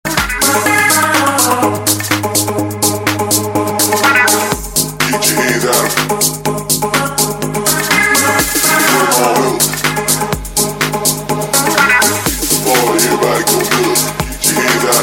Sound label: Street Funk